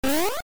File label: high jump